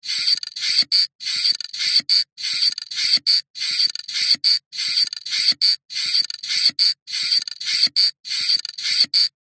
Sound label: industrial machine cogs gears small mechanical sequence; zapsplat